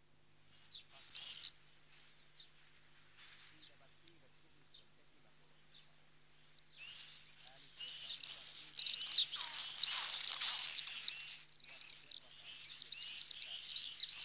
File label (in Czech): rorýs obecný